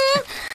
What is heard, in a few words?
Moan